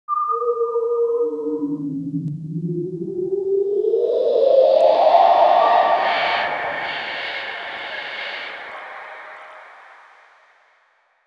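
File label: sound of appearing and disappearing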